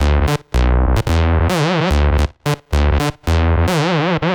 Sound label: 110 C Fat Noodles, Analogue Bass; Loops; Touch Loops; CosmicFunk